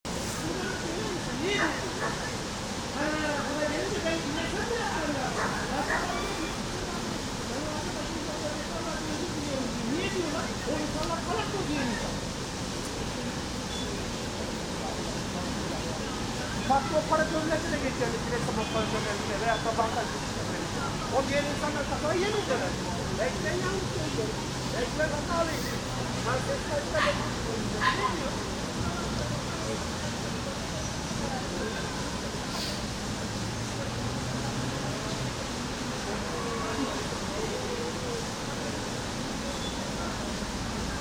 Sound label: besiktas rainy street soundscape